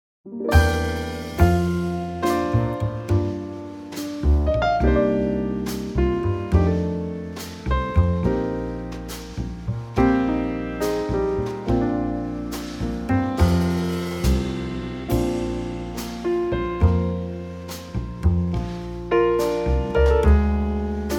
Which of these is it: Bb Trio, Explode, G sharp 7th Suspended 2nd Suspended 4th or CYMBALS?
Bb Trio